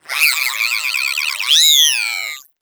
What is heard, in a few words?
egg jump; player; Portal 2; eggbot